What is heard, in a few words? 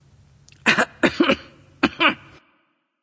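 clear throat, female; smite; voicepack; hera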